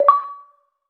xbox 360 achievement

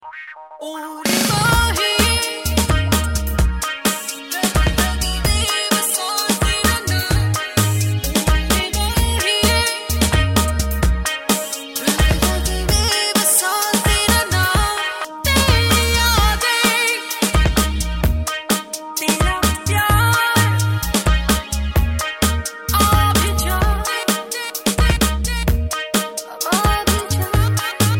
Dhol

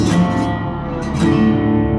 Guitar Twang

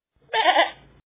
Goat; Kevin

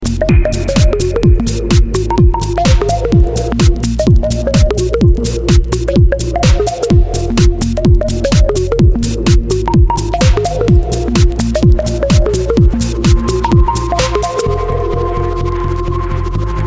electro, electronic ringtones

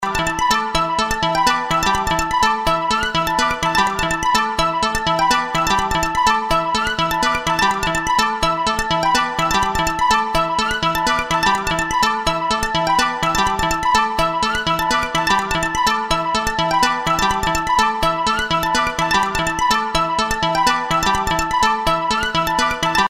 spring ring

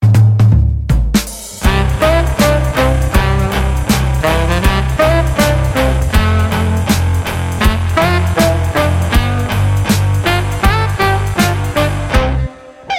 Saxy Rock Xmas

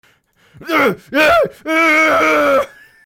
UGGH

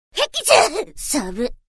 Ahhhh

